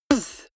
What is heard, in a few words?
beatbox snare punchy